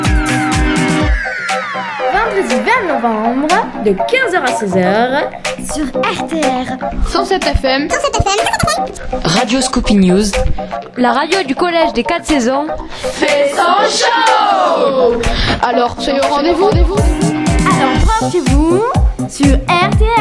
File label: jingle